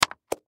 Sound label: Manual Drop Flop Book Pamphlet; Office; Blastwave